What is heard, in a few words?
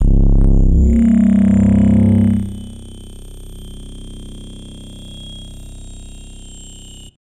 Alien Bass, Impacts Bass; SFX